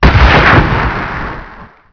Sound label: Rifle